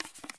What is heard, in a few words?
soda can drop; Escape from Tarkov